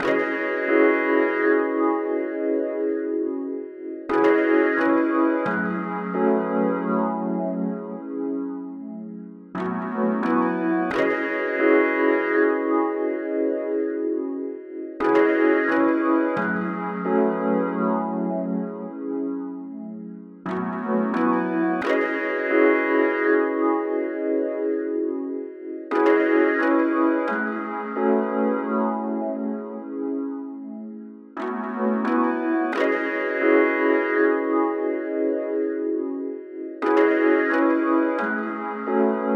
88 BPM C# Min Pad; Cymatics; Promises

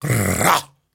Grunts and Chants, Viking Vocal

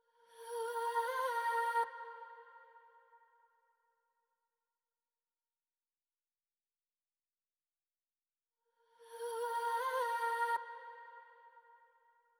Melody Loop 110 BPM G Min Vocals; Loop Stems; Cymatics; 2020 Melody Collection; 2020 Various

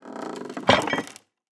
Item Wooden Chest Open or Close; Game Essentials

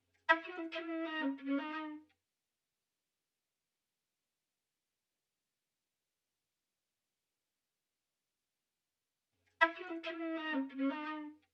West Coast Melody Loop - 103 BPM C# Min Flute; Melodies; Loop Stems; Cymatics; Oracle Sample Pack

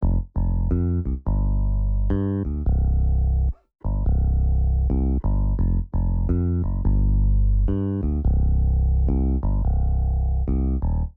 Classic Melody Loop - 86 BPM C Min Bass; Melodies; Loop Stems; Cymatics; Oracle Sample Pack

